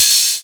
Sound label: Cymbals HH Open; Vengeance Samples